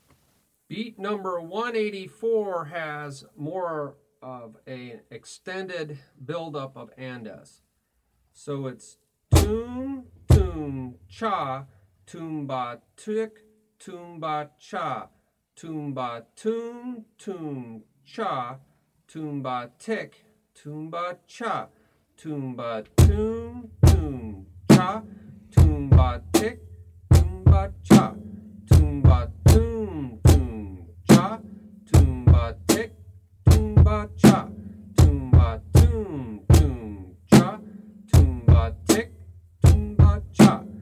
Beat 1 2 a3 a4 a Toom Toom Cha ToomBaTi ToomBaCha ToomBa